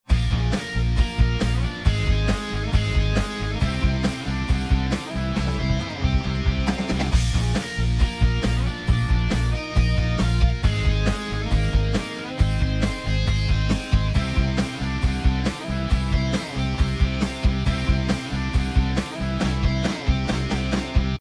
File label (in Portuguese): harmonia bVIi bVI I